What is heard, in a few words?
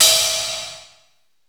BRUSH CYM RX5; Greytsounds VOL-1